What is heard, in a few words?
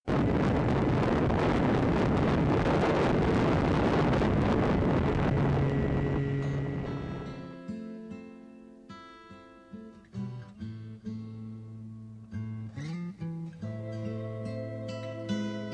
flight